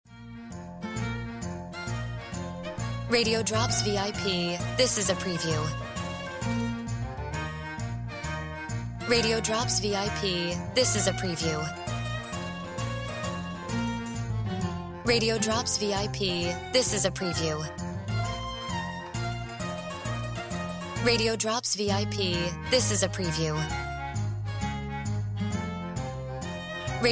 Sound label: Music Bed